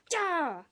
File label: Win Gesture; fs Jack; jack and beanstalk